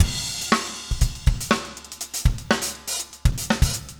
funk 120 bpm, loops

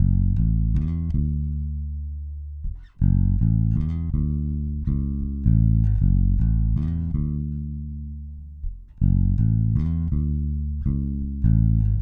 Bass Hip-Hop

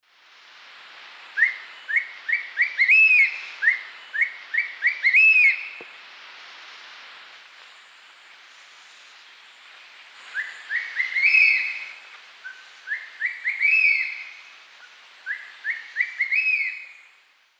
Spizaetus tyrannus, flight calls; S. America; Eastern Lowlands

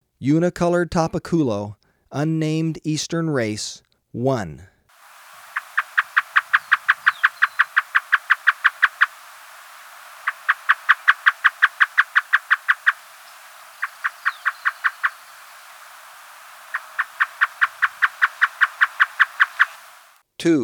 Unicolored Tapaculo, Birds of Ecuador; S. America

